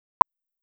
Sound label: 1KHz 0dB; 16 bit; 10msec